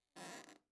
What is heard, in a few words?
ambience room lair work layer